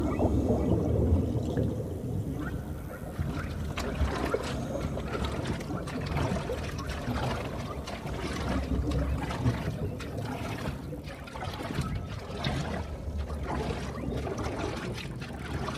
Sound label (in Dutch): Onderwater Rustig